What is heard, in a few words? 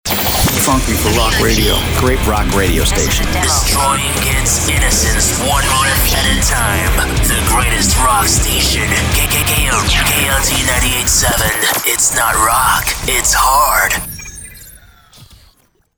ROCK Radio